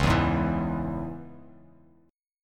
C sharp Minor C sharp, chord; strummed; 9,7,6,9; JGuitar

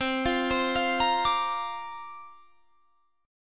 C 7th Suspended 2nd Suspended 4th C down

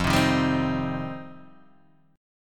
F 7th Suspended 2nd F Guitar Open D

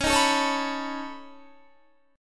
Db 7th Suspended 2nd Sharp 5th Db down Guitar; Db7sus2#5 strummed; JGuitar

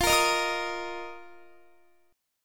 F 7th Suspended 4th, Guitar; strummed

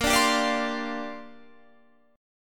A sharp 6th Add 9th, Guitar Standard; A#6add9 strummed; JGuitar

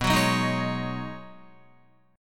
B 6th Add 9th B Guitar, chord; B6add9; strummed

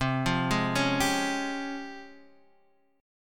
Db Major Sharp 11th C

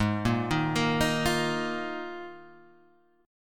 D sharp Suspended 2nd Suspended 4th G sharp

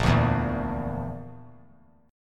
A sharp Major Flat 5th; strummed

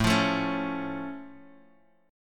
A Augmented A down Guitar Half Step Down

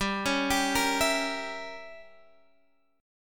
G Diminished 7th G down Guitar Open D

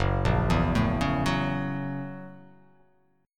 F sharp 7th Sharp 9th

